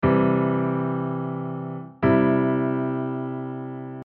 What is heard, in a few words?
open and close position